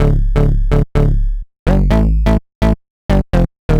126 D A BASS, Funky Underground bass loops; Loopmasters; Funky Underground Vol 2

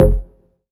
Synth Shot F; Mutekki Media; Vengeance Electroshock Vol.2

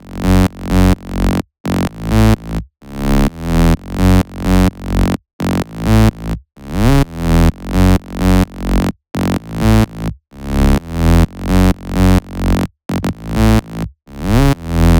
Electro House Melody; Mutekki Media; Vengeance Electroshock Vol.2; Electro House Kit 1

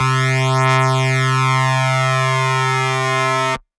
Dirty Dutch Lead C3

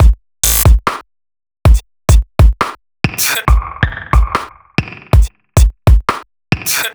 Bpm138 Break, dubstep drum loops; Violet

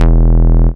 Bass Shot E; Mutekki Media; Vengeance Electroshock Vol.2; Bass Shots

